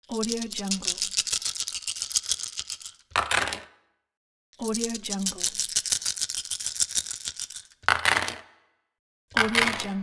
dice rolling

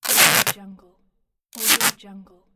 the scotch tape

